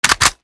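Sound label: foley assault rifle akilo47 reload empty fast chamber; weapons; viper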